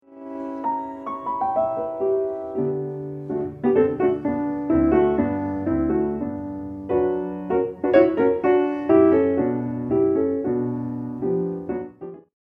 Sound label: Sonate metrical conflict